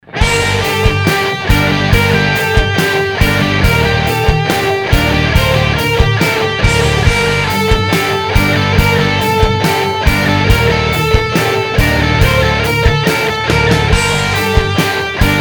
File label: EMG LTD, 7string